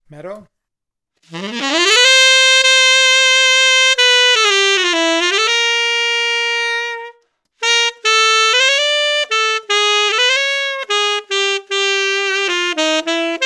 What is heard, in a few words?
Metal; GD